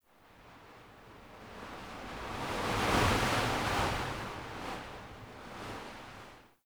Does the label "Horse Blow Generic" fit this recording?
No